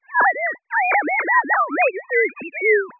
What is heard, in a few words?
sine